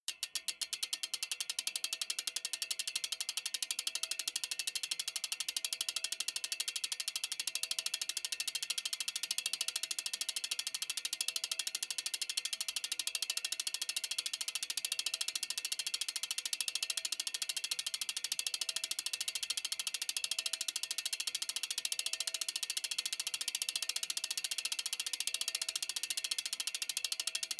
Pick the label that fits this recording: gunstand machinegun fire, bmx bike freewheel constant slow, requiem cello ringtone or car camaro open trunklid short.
bmx bike freewheel constant slow